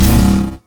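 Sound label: terror capacitor; LoL; battlecasturgot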